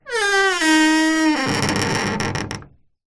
household wooden door old large heavy basement creak